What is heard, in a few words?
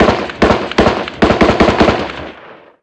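gun m16 medium; ModernWarfare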